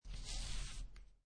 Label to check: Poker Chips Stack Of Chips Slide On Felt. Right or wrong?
Right